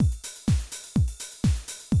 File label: 125, Classic Drum Loops